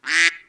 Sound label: duck, animals; soundfx